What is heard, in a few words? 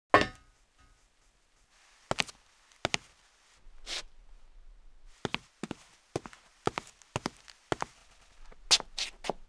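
footsteps; tomkroky; Mafie 1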